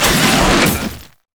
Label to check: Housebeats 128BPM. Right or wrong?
Wrong